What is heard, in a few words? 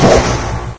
plasma, weapons